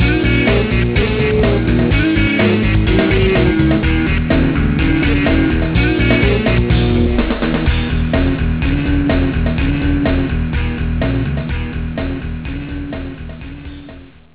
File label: rock